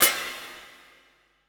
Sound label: E CRASH, ETH PERC